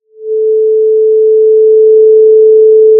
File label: Sound of a cruise ship horn